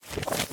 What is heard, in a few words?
Footstep Foliage Monstera Rev